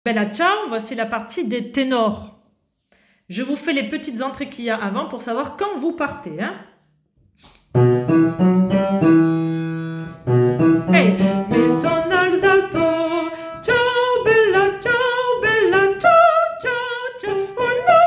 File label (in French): partie tenor; Bella ciao